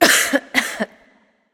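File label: clear throat, female; smite; voicepack; hera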